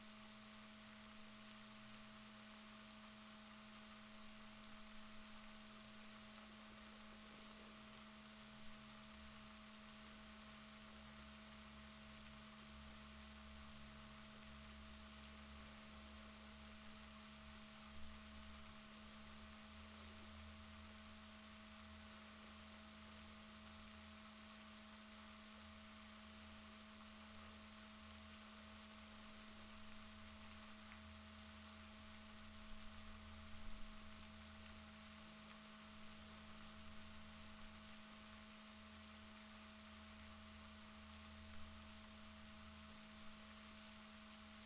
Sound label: rorquals positif, Balaenoptera Physalus Chile